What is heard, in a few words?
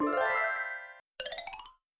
right answer effect